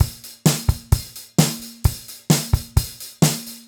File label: Beat Loops; Zero-G; Monster Beats